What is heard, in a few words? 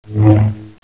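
crowbar miss; svencoop; sc incoming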